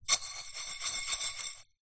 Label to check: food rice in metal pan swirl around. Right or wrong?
Right